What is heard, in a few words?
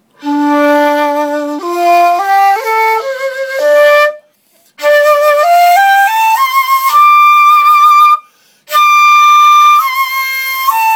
flutes, shakuhachi